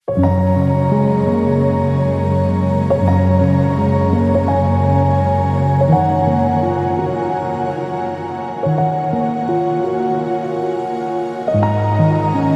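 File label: Green Cafe, ambient piano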